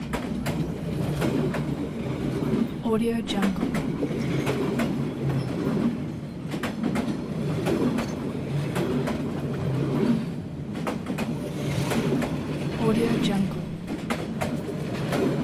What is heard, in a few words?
transportation train pass by slow loop